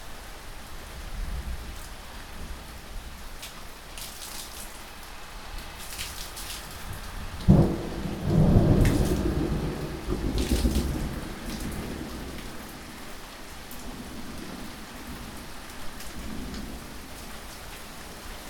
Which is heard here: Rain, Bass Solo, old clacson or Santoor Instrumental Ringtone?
Rain